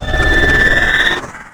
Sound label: dragon scream